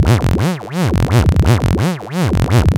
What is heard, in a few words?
LFO, Noise